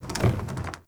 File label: Pylon Plastic Roll; Warframe; Ambience; Nineteen Ninety Nine